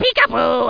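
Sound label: battle hit; Screen Wreckers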